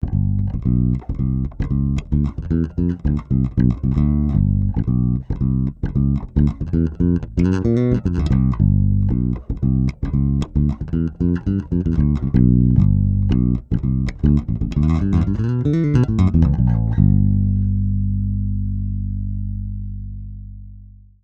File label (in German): phil jones double four bg-75 fodera gezupft line